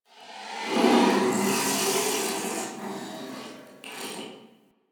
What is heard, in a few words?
Digest Interior Small, Ambience